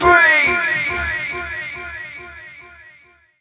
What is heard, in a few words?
Vocals; Vengeance Samples; Special Sounds